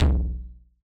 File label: Yamaha DJX 2B, Hard Tom